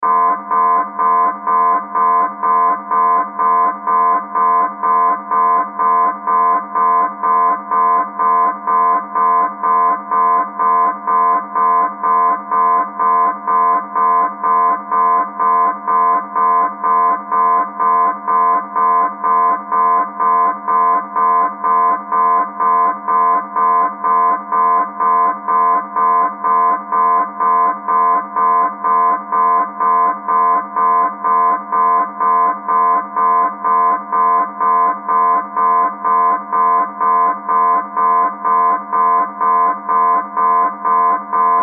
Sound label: Submarine Alarm Horn Alert Interior; Military; Miscellaneous Combat; Warfare